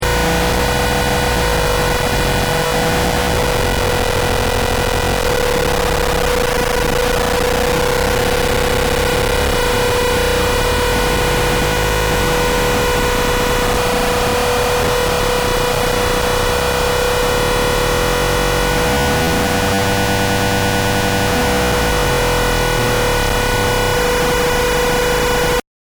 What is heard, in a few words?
PWM Overload